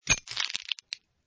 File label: Camera Flash Bulb Crackle